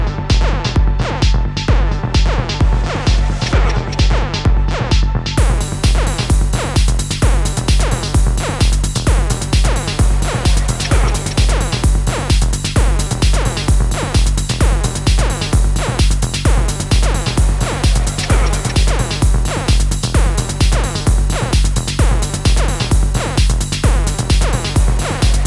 Crackle

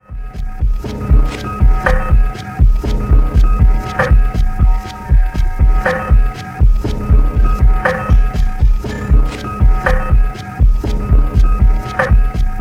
Rolling Thud

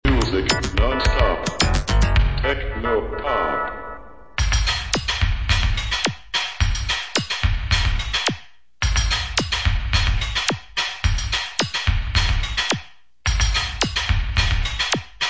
Techno Pop

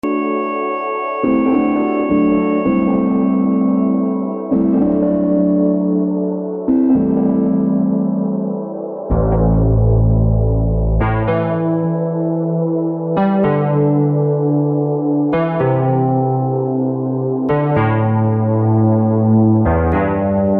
Techno

